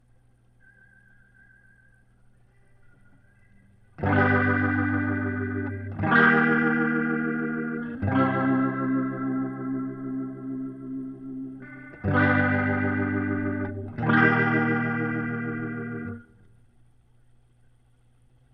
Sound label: Leslie gtr